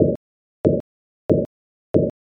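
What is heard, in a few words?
footsteps soil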